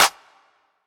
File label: clap sound D key